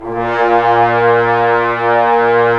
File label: BRS BNS 18L, Roland LA Composer, Tbns; 90 s Sample CDs